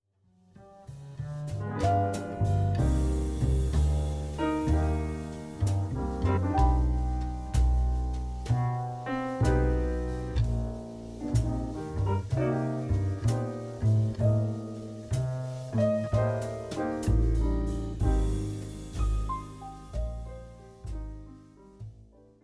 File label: backing